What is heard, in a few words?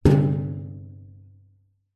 metal tool tray empty knock bash resonate; foley; zapsplat